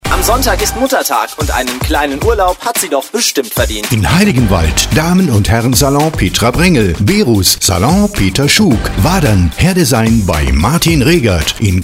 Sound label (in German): Muttertag Rap Reminder